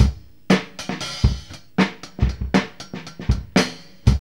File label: DOPE BEAT 118, Funky Elements loops; Zero-G